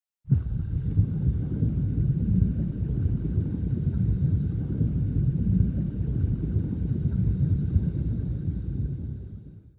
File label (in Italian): acqua water, natura